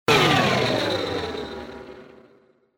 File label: radio impact burst descend; sfx; overte; partyBall